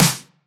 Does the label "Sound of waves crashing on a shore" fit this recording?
No